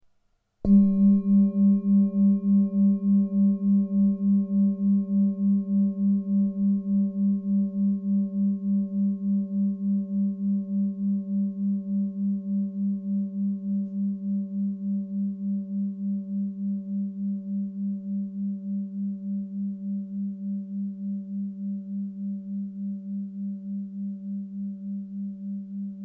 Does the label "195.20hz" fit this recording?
Yes